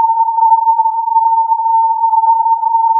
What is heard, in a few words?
filtered input